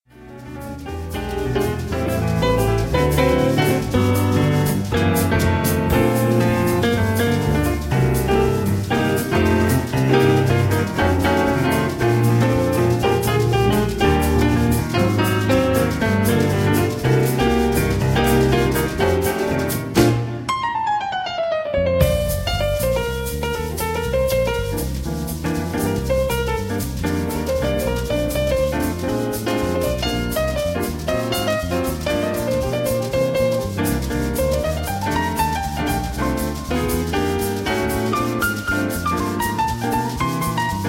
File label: melody